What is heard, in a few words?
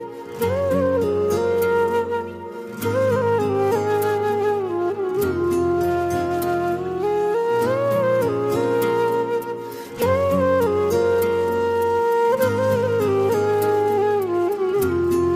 instrumental ringtone; ARY